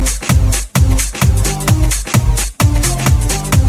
New Cool Beat

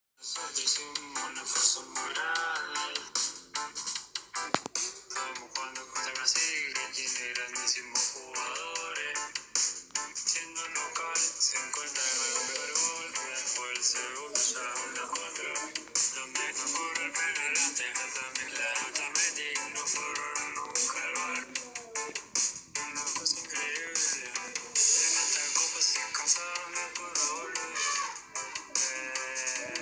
Reggae; Leo messi

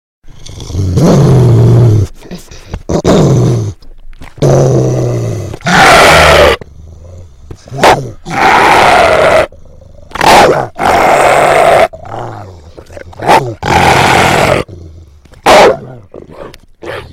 Dog Growling

